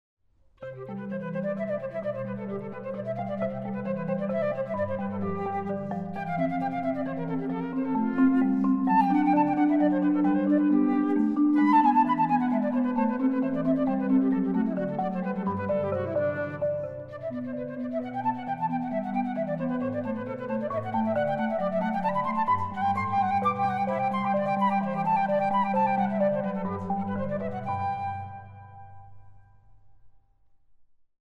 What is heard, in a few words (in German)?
Sonate C–Dur, Allegro; Johann Sebastian Bach; BWV 1033